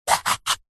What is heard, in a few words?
container plastic display case small lid slide open or close; zapsplat